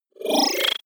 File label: disappear flicker; soundpool